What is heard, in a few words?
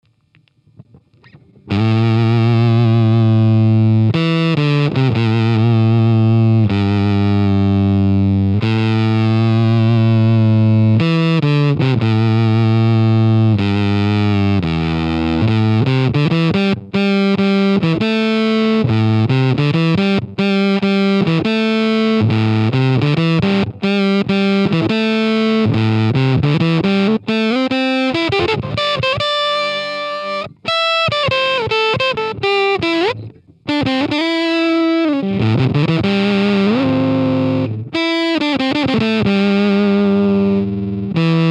fuzz